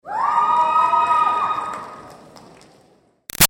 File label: Crowd Teen Grad Scream Girls; Graduation; Reaction; BigRoomSound